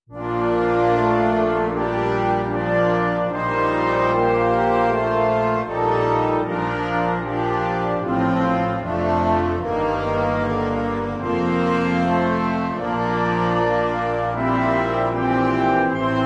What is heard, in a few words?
Highwood Hark What A Sound - Fanfare